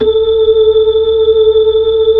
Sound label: B-3, Hollow; Keyboards of The 60's and 70's